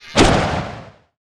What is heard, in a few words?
Bow Mineral Cast; bullet